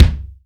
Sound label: thick kick drum one shot c sharp key